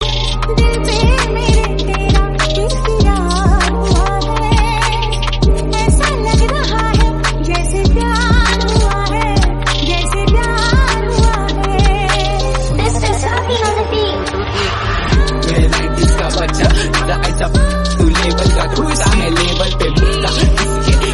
Remix Hindi Rap Song

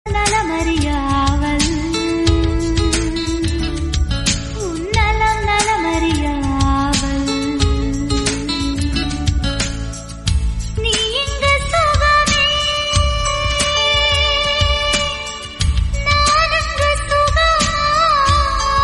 Tamil Song Ringtone; Kadhal Kottai

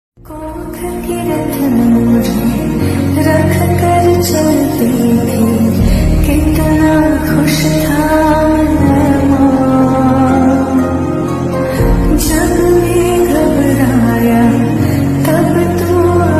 Hindi; KGF

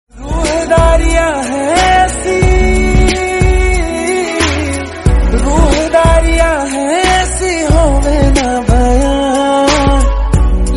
Song

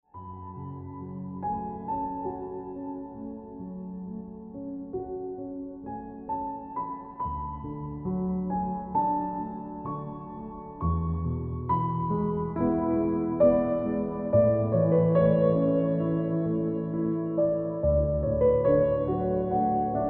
Ringtone Piano Music

